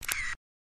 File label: camera shutter